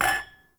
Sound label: Glasses Clink; Warframe; The New War; Zariman